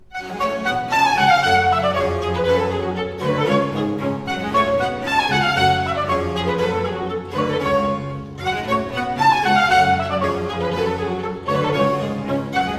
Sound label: Rigaudons; Suite from Les Fetes D'Hebe; Rameau